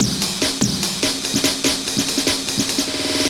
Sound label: Jungle, Drums & Percussion, Electronica; Sonic Foundry (Sony Creative Software); Electronic Point Blank